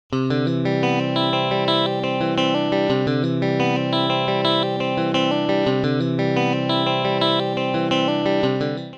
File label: chord phrase; fretjam